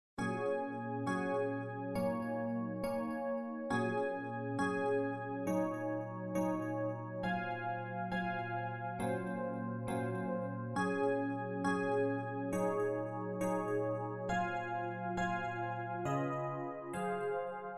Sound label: slow piano djingle